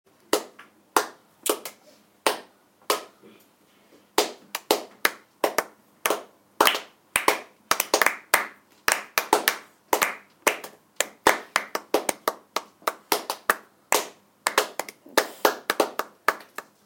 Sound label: clapping